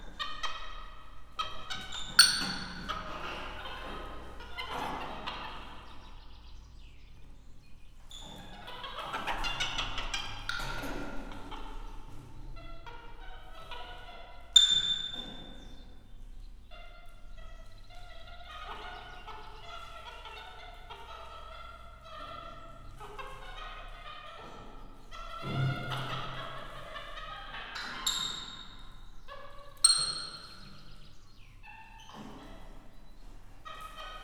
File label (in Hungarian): ide oda mozog, kapucinus majom; debreceni zoo 2012